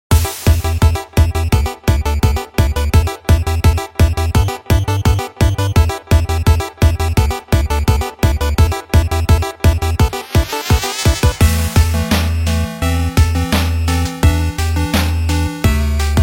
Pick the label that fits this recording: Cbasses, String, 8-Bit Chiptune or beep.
8-Bit Chiptune